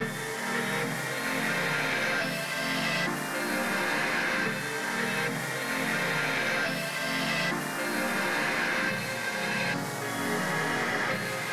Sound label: BGM, Music; ゆめ2っき